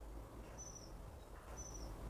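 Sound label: ambient with birds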